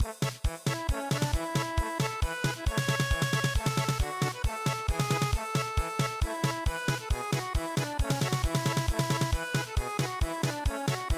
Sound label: German Polka; Maamme Laulu